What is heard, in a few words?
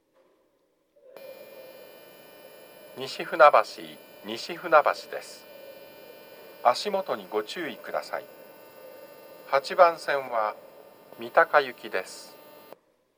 nishi funabashi mitaka, station